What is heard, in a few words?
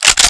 galil bolt pull; new weapons; cstrike